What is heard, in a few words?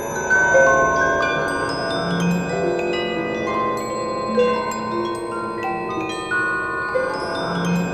F#3 EVIL, SPIRIT BELLS; Northstar; The Wizard VOL-1